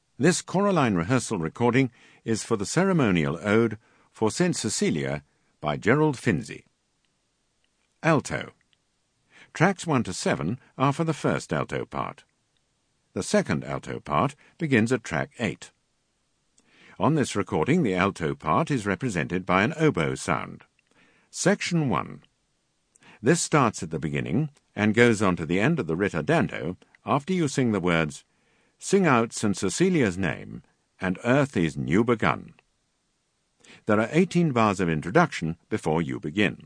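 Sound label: Alt, alto; Finzi For StCecelia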